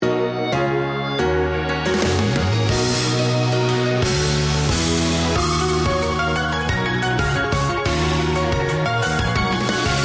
bgm combat; Ange Vierge Girls Battle; ange2

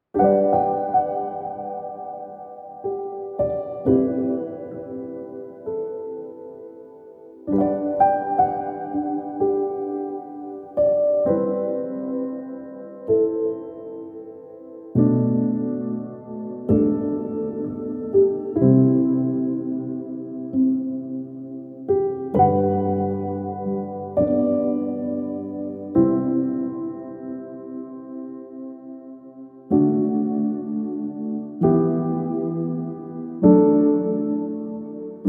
65BPM; LondonFuture

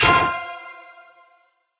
Victory Stars

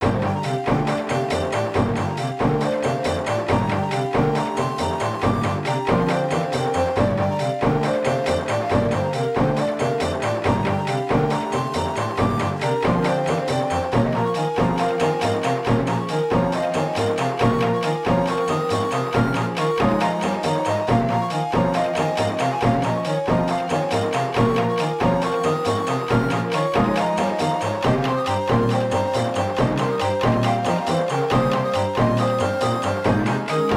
Battle - Upbeat; Sakura Samurai Art of the Sword; Wii; Cliff

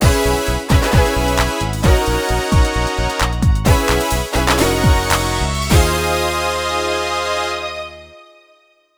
Golf - Hole in One; Super Monkey Ball 2

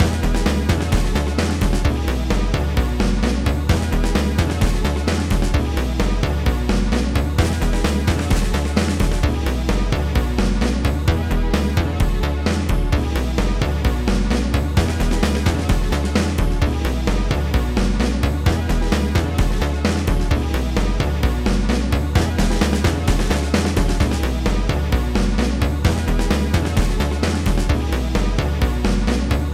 Rock Menu; Maximum Action; pre new blood ost

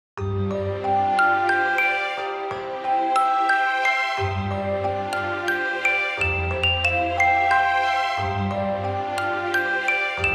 Music Box; Final Fantasy Tactics remastered

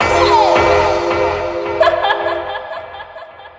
Retry Jingle; Friday Night Funkin Party Week ost mod windows gamerip 2023

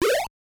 Enemy Flipped; Mario Bros.; NES

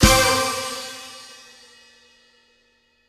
Disco Stinger Stab; Plants vs. Zombies Battle for Neighborville

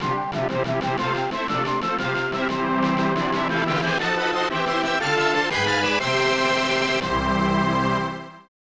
Stage Clear; Cooly Skunk; SNES

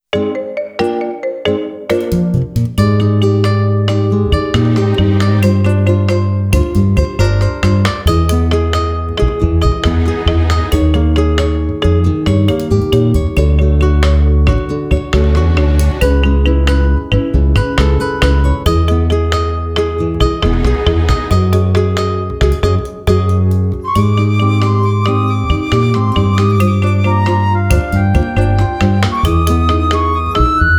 Polka; Homeland; GC

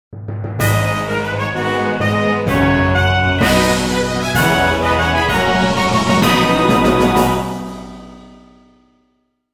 Fanfare; Adventure Time: The Secret of the Nameless Kingdom

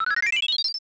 Collect Large Gem

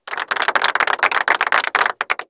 Applause; Golf Blitz 1.12

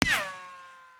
Hit Bullet Ricochet

